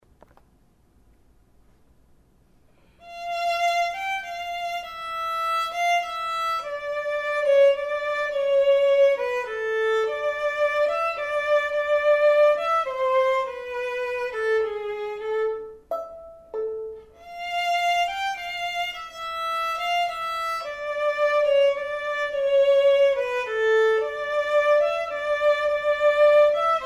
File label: perf vln